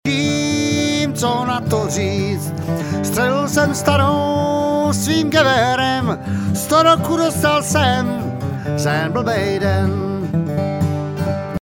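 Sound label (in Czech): 100 roků vocal lead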